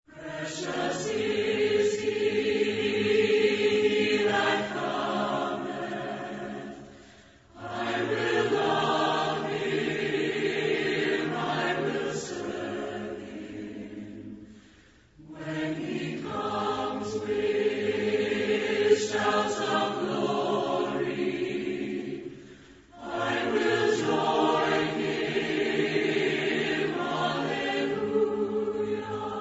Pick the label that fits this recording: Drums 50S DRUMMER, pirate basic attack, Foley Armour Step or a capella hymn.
a capella hymn